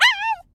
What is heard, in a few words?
Trusty Pup Death